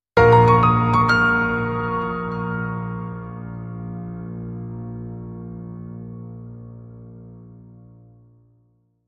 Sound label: Solo Piano Sting